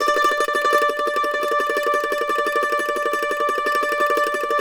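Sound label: mandolin tremolo D5; Sampleheads; Whole Lotta Country 1 & 2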